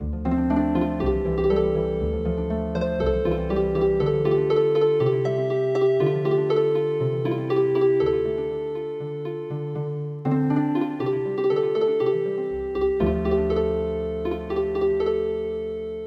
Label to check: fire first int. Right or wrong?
Wrong